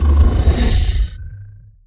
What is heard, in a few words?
Explosion Ghost; KriptoFX; Realistic Effects Pack v1